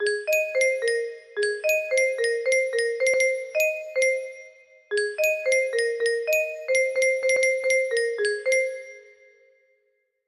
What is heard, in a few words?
chime, music box melody